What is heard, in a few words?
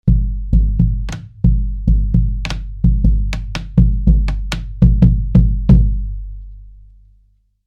bombo